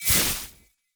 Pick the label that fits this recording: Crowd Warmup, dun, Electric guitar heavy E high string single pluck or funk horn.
dun